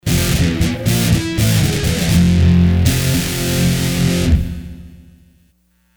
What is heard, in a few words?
Metallic-A; Sony HR-GP5